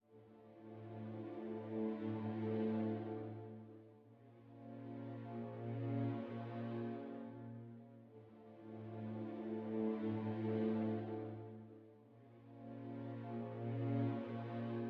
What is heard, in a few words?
music interactive; World of Tanks